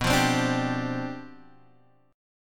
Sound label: G 11th B